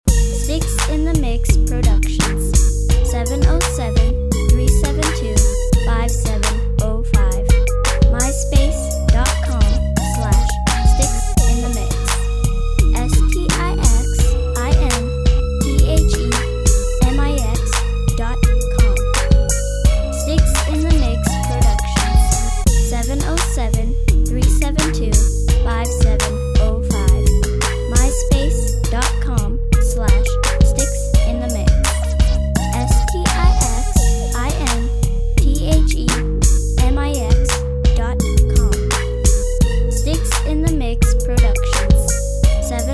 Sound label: slap bass